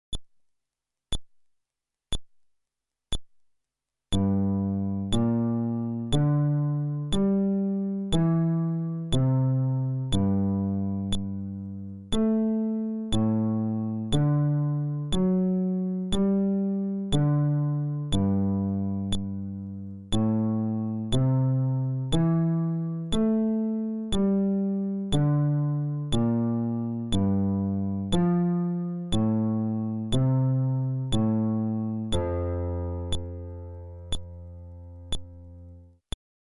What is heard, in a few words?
Arpeggio, fretless bass